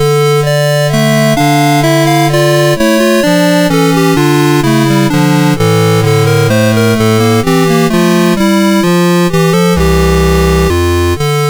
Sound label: Sarabande; MOS6581R2